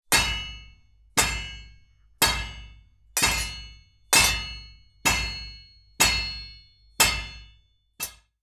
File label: Blacksmith hammering axe metal hits; Metal Sound Effects